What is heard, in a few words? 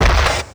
giant pyro step; Garry's Mod; tf2; mvm